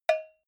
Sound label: cowbell hit muted soft